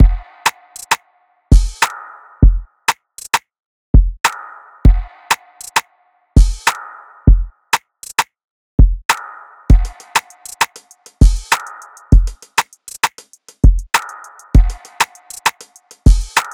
Drum Loop - 99 BPM; Feeling Good; Cymatics - Infinity Beta Pack 2.0; Infinity Drum Collection

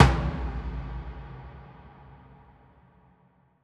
Percussion Hit; One-Shots; Ghosthack; SkyRealm; Percussion Hits

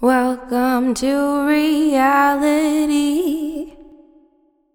Welcome to Reality - 128BPM, Female Vocals, Wet; Advent Calendar 2017 Day 1

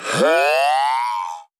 Processed Chant - "Hyuah"; Cymatics; Euphoria Vocal Sample Pack

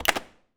Foley (Open)

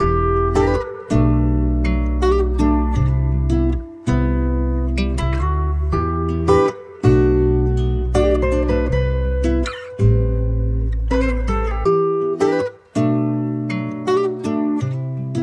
162 BPM E Min, Guitar; 27 Club; Cymatics; Miami Guitar Pack - Pt 1

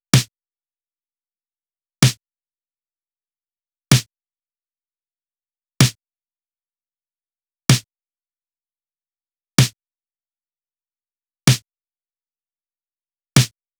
Drum Loop, 127 BPM Snare; Loop Stems; Cymatics; Vibes II Drum Loop Collection; Matriarch Drum Loop

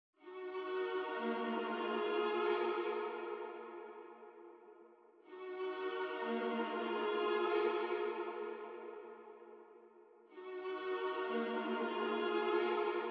95 BPM D# Min String; Melody Loops; Cymatics; Hip Hop Sample Pack; Fina

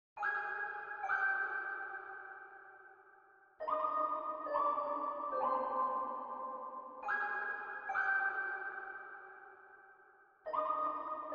140 BPM C Min Pluck, Loop Stems; Melodies; Cymatics; 2022 Melody Mystery Expansion; No Loyalty

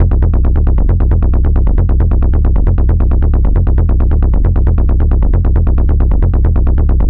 Bass Loop F 135, Techno; Ghosthack; AC2024 - Day 1 - Techno Sounds 2025

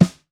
Brass Brush and Stick, snare; Drums; Ghosthack - Free Snares 2023; Blk Brass

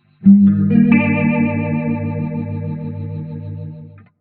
Guitar One Shots Gm6; Guitars; Ghosthack; Advent Calendar 2019; Xmass

